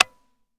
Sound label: disconnector; gmod; viper